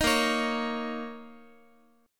A sharp Minor Double Flat 5th, A sharp, down, Guitar; A#mbb5 strummed; JGuitar